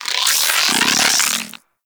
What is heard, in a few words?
Charge, Weapons; Warframe; Infested; Quanta